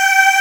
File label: Aahs A3